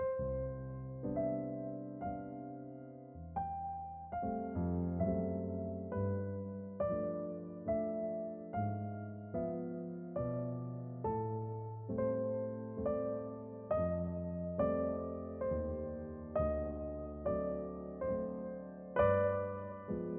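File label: Cool Breeze Waltz Duet, piano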